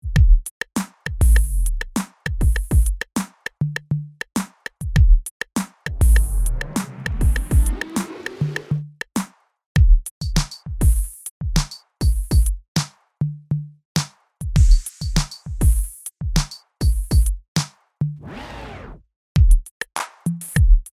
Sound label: vinyl drum machines, 50hz